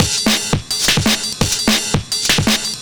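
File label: Drum and Bass Beat 170, drumnbass 170bpm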